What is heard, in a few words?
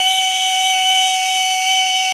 ney sol